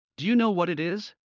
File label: Heart fast; Classroom humanbody